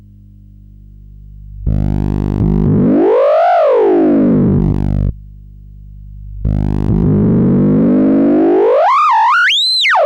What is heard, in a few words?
pitch test, Theremin; Building a Theremin